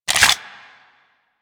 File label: Flux Rifle Reload; Crp Laser Rifle; BE Flux Rifle